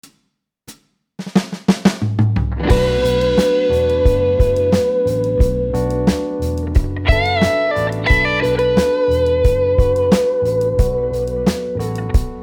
Style Solo

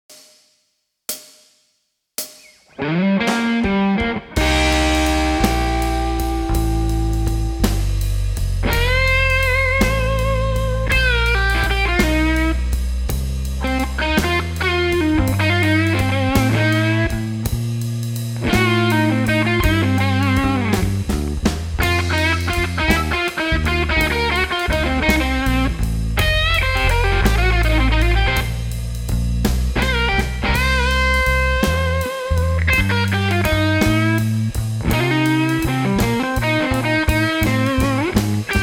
Sleepy Time Performance, blues slide solos

